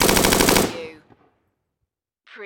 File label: Machine Gun Bren